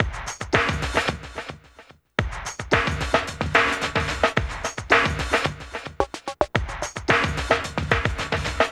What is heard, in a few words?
LOFIBT; Spectrasonics